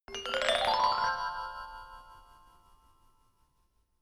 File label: notification glockenspiel low slide up soft